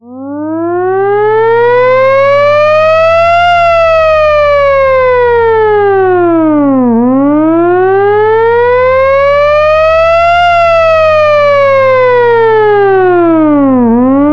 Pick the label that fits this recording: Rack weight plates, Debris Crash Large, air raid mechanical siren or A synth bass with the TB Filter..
air raid mechanical siren